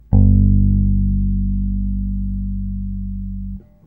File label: Bass G1